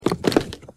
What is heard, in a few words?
box